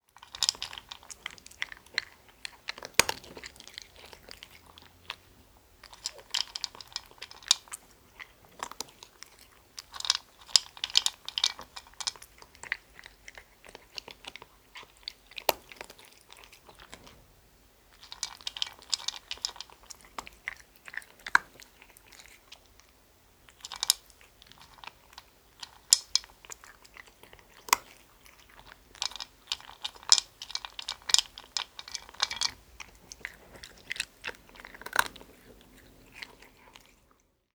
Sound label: Cat Eating Dry Food; افکت صدا حیوانات; Animals